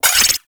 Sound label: Igniter Pistol Mech; Warframe; Weapons; Corpus